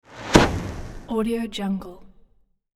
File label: potion explodes; hollywoodedge